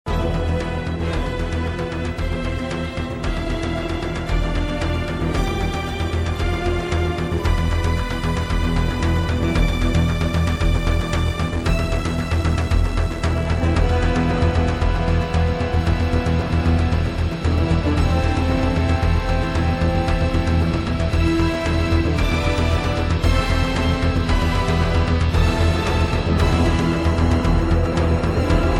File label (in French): pedale; xmen